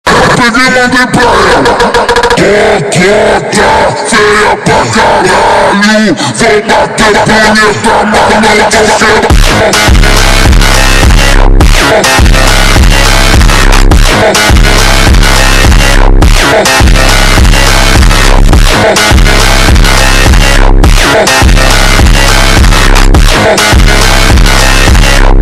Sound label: slowed x reverd x bassbust